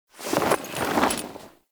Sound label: Camp Canopy Flap; Quests; Veilbreaker; Kahl Camp